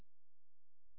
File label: silence